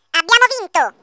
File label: victory